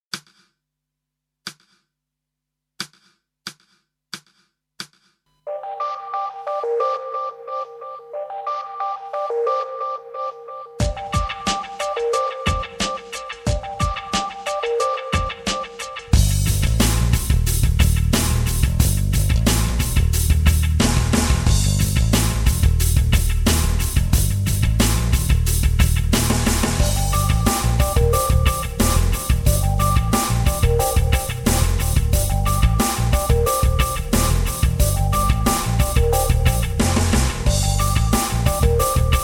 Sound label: Style Backing